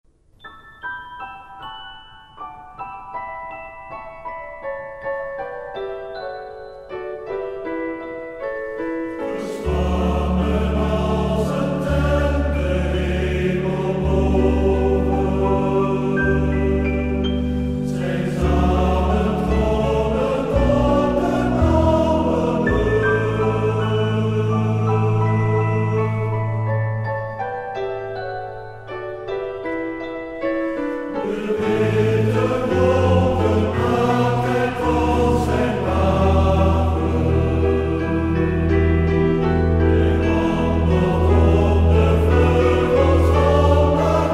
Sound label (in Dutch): TTBB